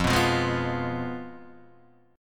F Diminished Guitar Open D; down; chord shape

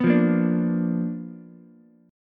B Minor Major 7th Flat 5th Ukulele

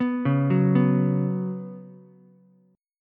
Db 7th Suspended 4th Db down Ukulele; JGuitar

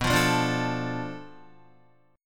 B Minor 7th Flat 5th B Guitar, chord; strummed

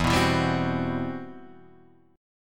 C Major Sharp 11th E

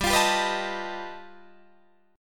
G Augmented 9th, Guitar, Open D

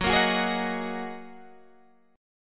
F sharp 6th Add 9th F sharp Guitar Standard; F#6add9 strummed

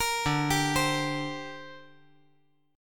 D 7th Suspended 4th Sharp 5th down, chord

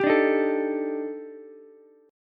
Eb Major 7th Flat 5th, Ukulele; EbM7b5; strummed; JGuitar

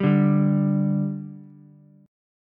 G 5th G Ukulele, chord; G5 strummed

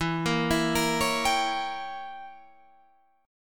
E Diminished 7th Guitar Standard; Edim7 arpeggiated; 12,13,14,15,14,15; JGuitar

